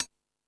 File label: Tool Kit, Drum Machines E-MU Pro-Cussion